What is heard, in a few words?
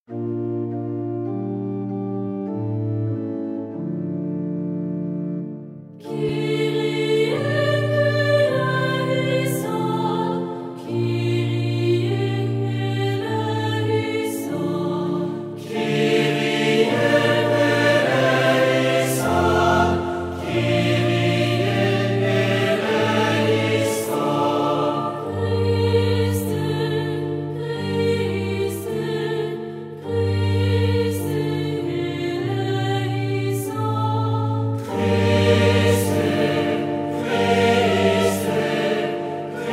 Choeur Missa pro Europa Kyrie eleison; ADF Bayard Musique; parcours jubilaire dans la cathedrale